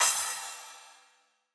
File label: short crash